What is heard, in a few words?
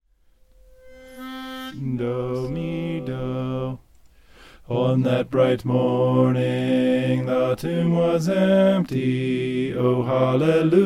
bass